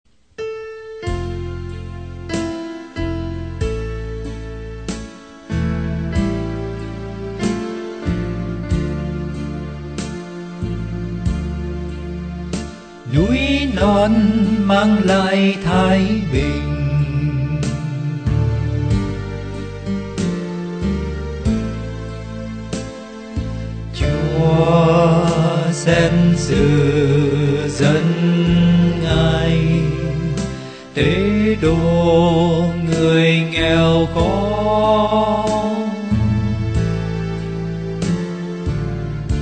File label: alto